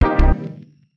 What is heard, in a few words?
Ethereal Chord D Maj